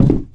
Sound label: wood box footstep; physics, legacy